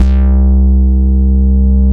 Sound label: Bass Note C